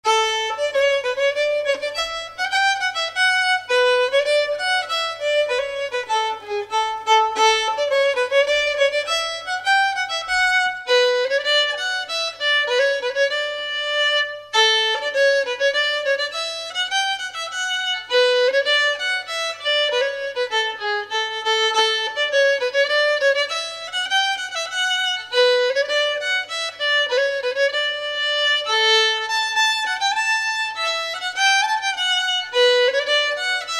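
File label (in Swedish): Slängpolska; efter Sven Donat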